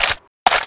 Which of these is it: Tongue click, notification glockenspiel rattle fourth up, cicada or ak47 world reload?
ak47 world reload